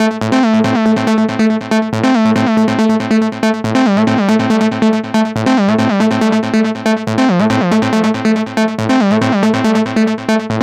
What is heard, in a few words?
NRG Lead, trance